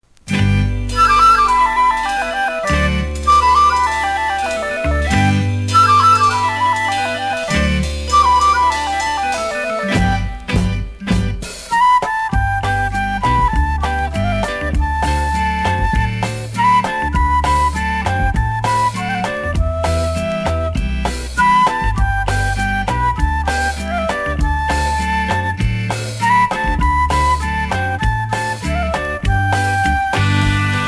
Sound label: reggae beat